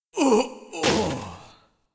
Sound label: Mob die; SubBossmob; 욱일승천류; 겐지